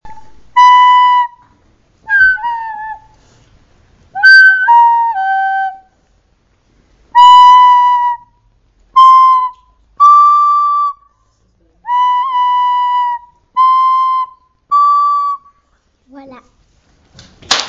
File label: flute